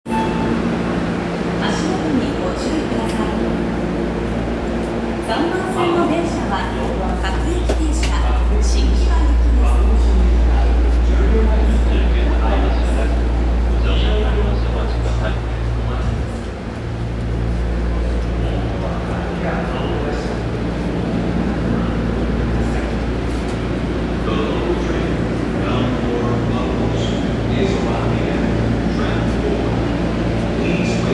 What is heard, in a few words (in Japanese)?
有楽町線線池袋東, train sounds